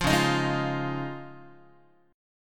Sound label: C sharp Major Add 9th, Guitar; C#add9 strummed; JGuitar